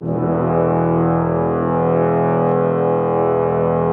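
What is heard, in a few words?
122 BPM Trombone A major F minor Tonic E; mmb studio soundtrack essentials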